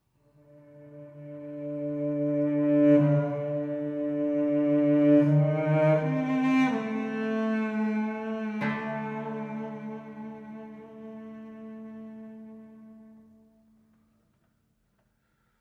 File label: cello version, cello and koto